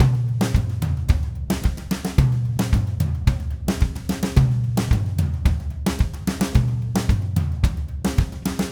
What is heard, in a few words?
Red Maple Groove 110, DW Red Maple Kit